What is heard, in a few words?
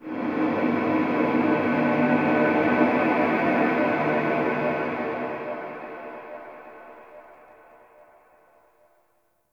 Corpus Ship Energy Distant Texture Large; Textures